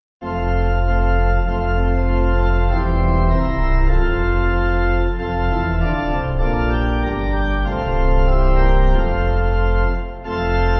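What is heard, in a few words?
pipe organ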